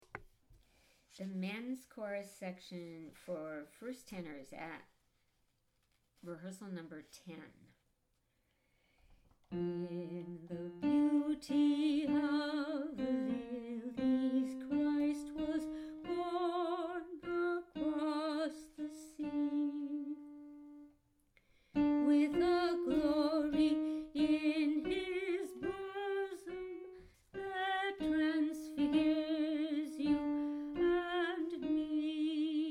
battle hymn mens chorus

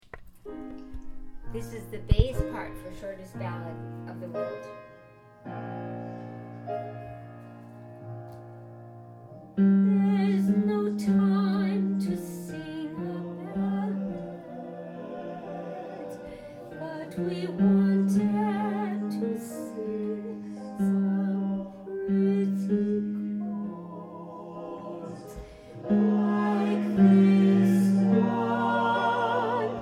shortest ballad bass

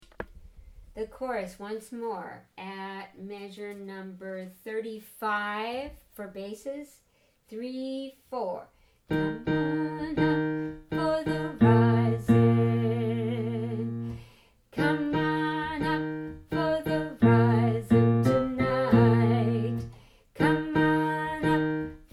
low bass; m. 35

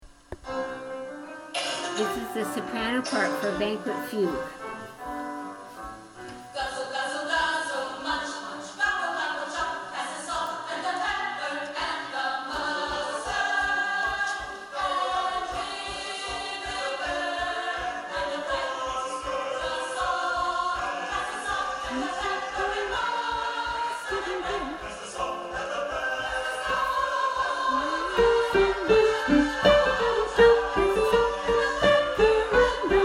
sop

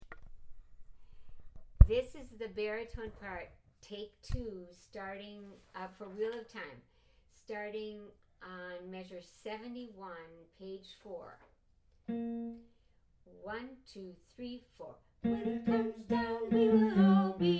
baritone; wheel of time

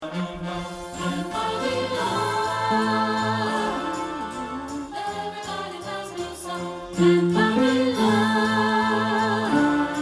bass

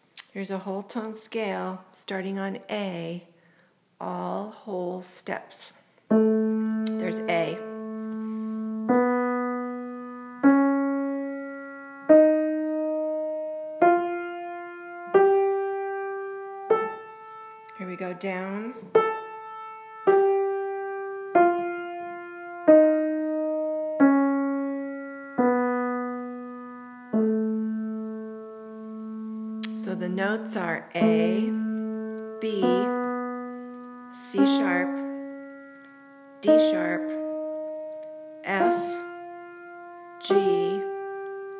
whole tone scale

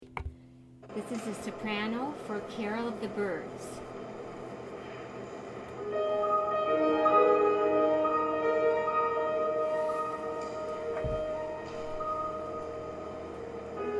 carol of the birds

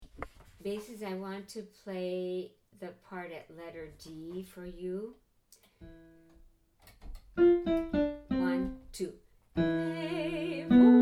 ritmo bass

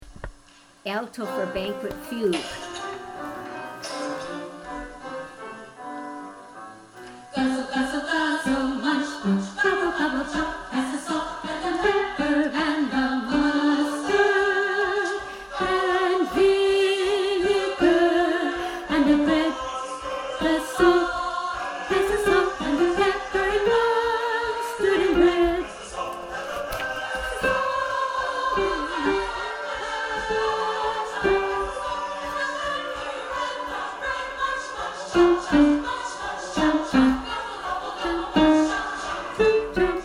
alto

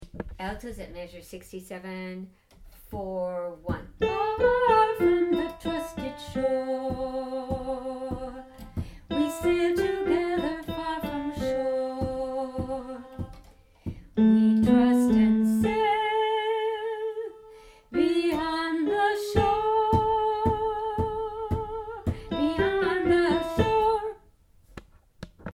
serenata alto

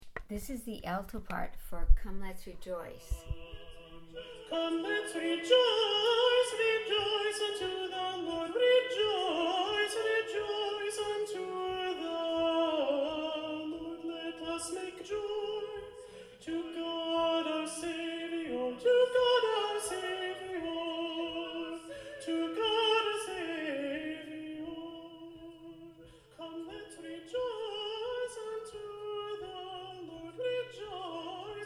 come lets rejoice alto

